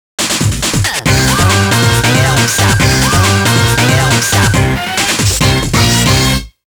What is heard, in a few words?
Groove Rush